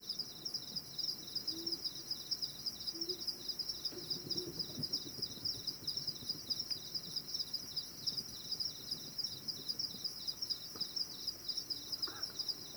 Omani Owl Compound Hooting Of Male; Moment Of Discovery

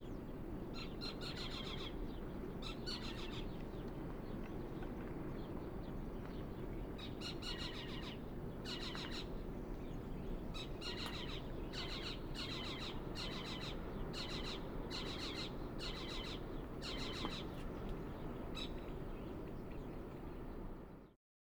Cucumiau Cackling of adult

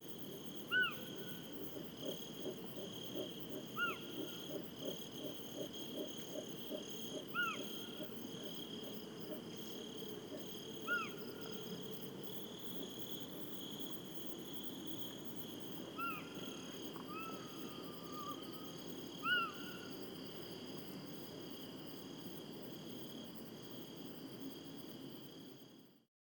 alarm whinny

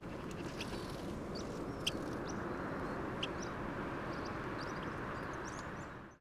Ortolan Bunting Calls Of First Winter Male

